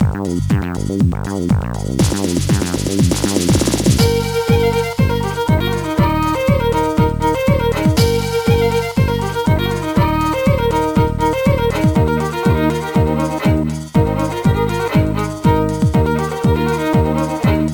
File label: Soul Music; the urbz sims in the city; gba